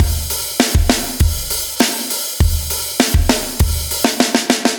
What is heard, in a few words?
Walker Drums, метал